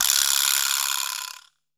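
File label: vibraslap, GM percussion kit; AKAI S6000 CD-ROM - Volume 5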